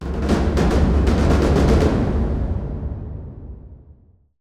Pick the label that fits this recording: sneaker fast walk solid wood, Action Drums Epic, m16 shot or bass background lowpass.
Action Drums Epic